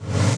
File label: UNZONE, interface